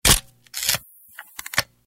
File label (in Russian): udar i razlom; Разлом бревна; топор; В лесу звук топора по дереву